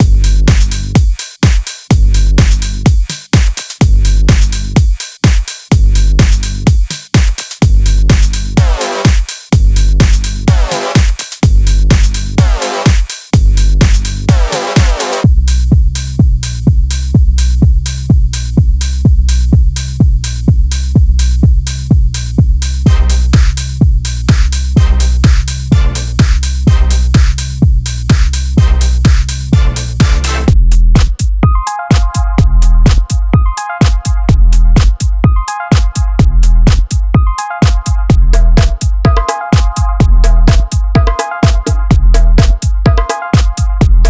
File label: afro house and tech house